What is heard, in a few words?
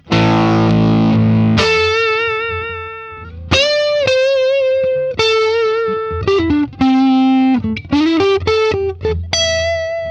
Reverb Crunch